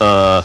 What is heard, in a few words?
Volume Change; Cyclone II